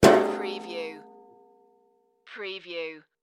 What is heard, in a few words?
Metal Folding Chair